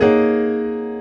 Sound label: CHORD R, Drum & Bass 140 BPM; Ueberschall; Drum & Bass Flash Trackz